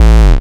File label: Offbeat Bass A; Vengeance Samples